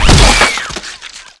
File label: gib scream